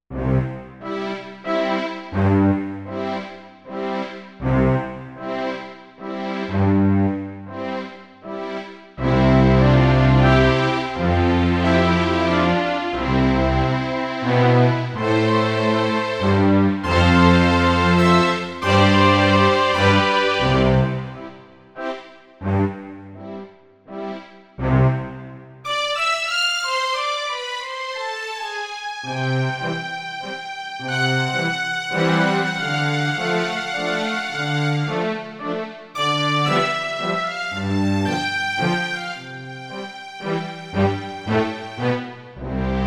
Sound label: Orchestra